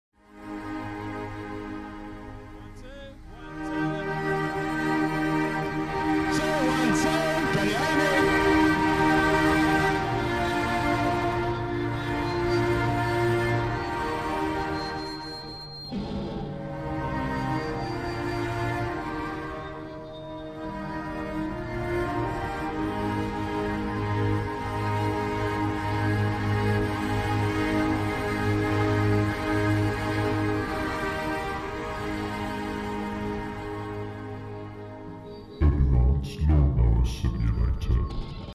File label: orchestral intro